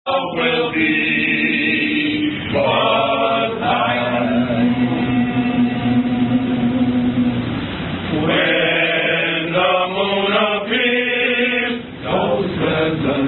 Chorale Sings; The Gamma Pi Chorale Sings The Sweetheart Song

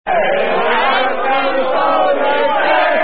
Fragment Of Unknown Song; Bali

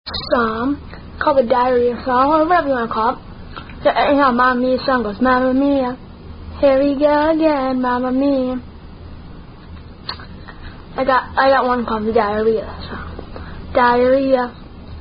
Diarrhea Song; The Diarrhea Song (Variant

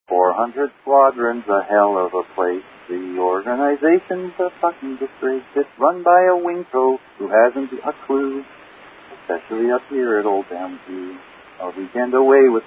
Squadron Song

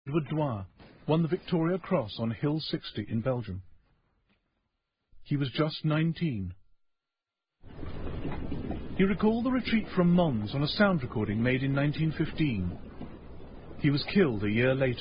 British Soldier Singing; We're Here Because We're Here

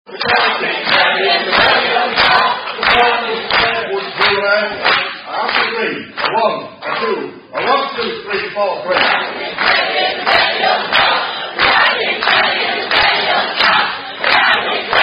Singing; Sir Singing In Leavers Assembly; The Cow Kicked Nelly In The Belly

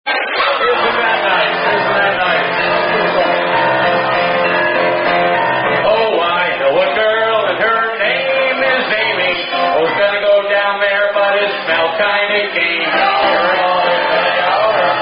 Birthday Song; Roll Your Leg Over

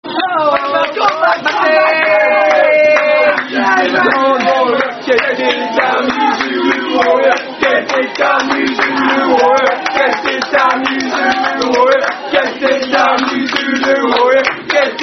Zulu Warrior, Chanted (Not Sung)

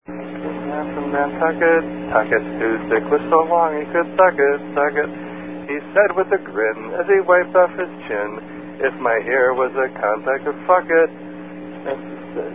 Sung Limerick, Aye Aye Aye Aye Chorus; There Was A Young Man From Nantucket

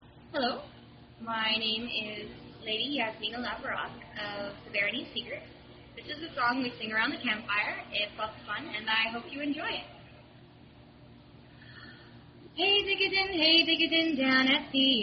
Songs Down At The Inn; Aka Follow The Band, Hey Jig A Jig Kiss A Little Pig

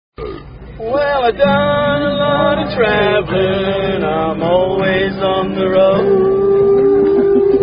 Unknown Hash Song

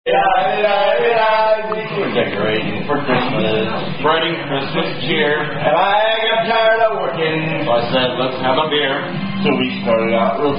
Irish Drinking Songs, Christmas Drinking Song

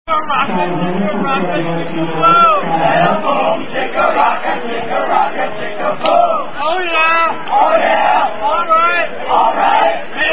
I Said A Boom Chicka Boom, Soccer Team Chant; Unknown Soccer Team Chant

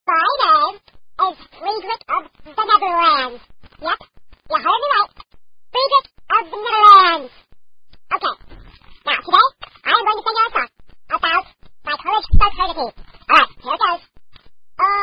Fraternity Song; Freedrick Of The Neverlands

